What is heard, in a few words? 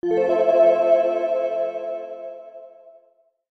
button